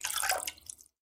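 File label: water stream soft close gentle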